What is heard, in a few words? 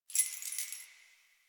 Keys Jingle; Warframe; Ambience; Nineteen Ninety Nine